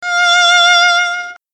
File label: violin; violintone; grundsvingning og ovettoner lagt på lag på lag